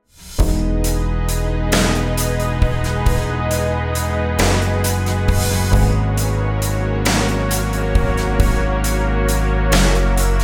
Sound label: rhythms compound 8s w16s